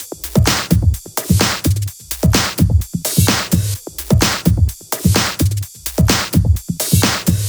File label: 128BPM Kit; Vengeance Samples; One Stop Kit